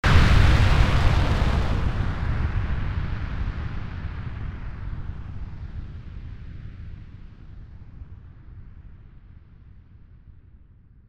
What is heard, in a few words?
explosion